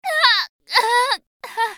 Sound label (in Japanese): 死亡; カルヤライネン